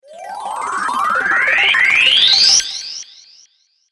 Game Award Sparkling Whizz, Video Game Sound Effects